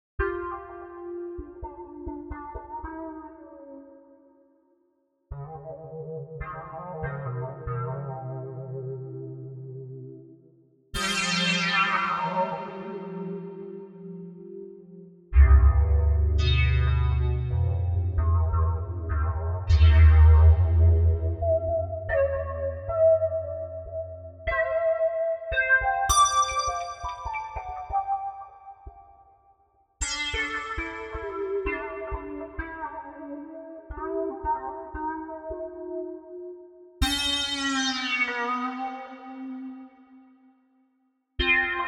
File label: String Studio VS3